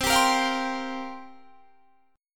C 7th Suspended 4th, Guitar Drop D, chord

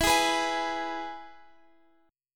E Major guitar chord, Open F; strummed; JGuitar

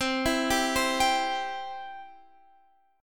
C Major, C, chord

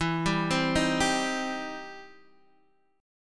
E Minor Major 7th Sharp 5th, Guitar, Open D; down

